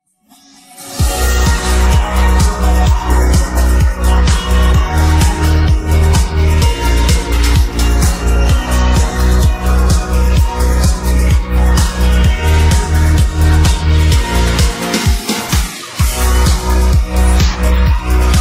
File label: INSTRUMENTAL